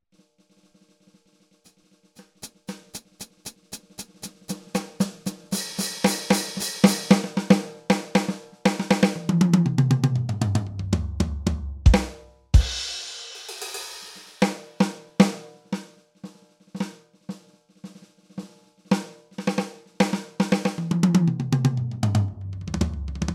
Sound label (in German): Alesis DM 10 MkII Pro Kit Akustisch SN Response